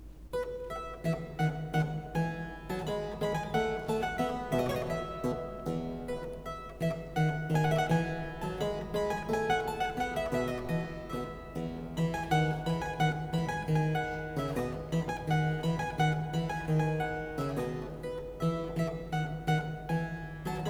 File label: Allegro in F major, Keyboard Works; Mozart